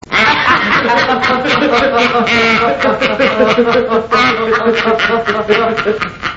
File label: duo laugh